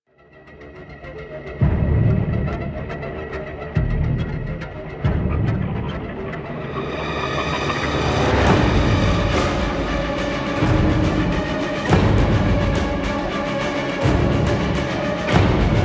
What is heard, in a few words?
Arsenal Music; Warframe; Sacrifice Quest